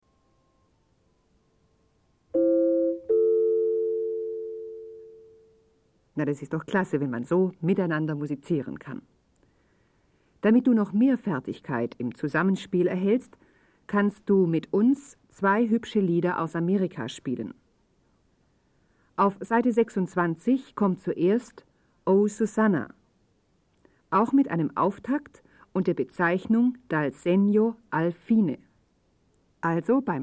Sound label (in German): Spiel Blockfloete